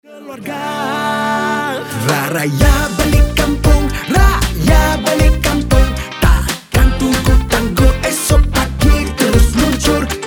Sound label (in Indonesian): electronik modern